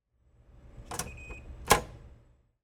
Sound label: food vending machine door